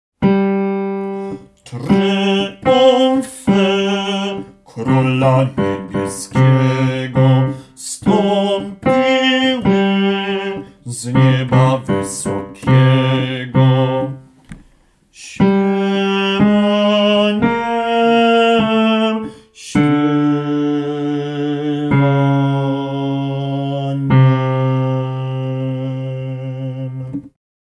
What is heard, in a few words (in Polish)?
BAS